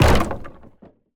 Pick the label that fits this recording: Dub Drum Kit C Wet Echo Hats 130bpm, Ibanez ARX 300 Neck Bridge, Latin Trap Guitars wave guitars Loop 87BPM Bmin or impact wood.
impact wood